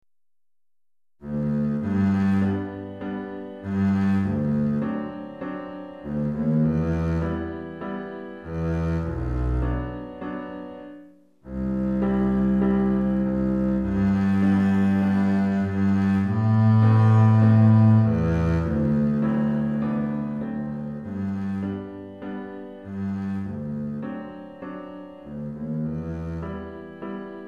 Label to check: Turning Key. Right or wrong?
Wrong